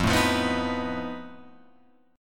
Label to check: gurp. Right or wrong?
Wrong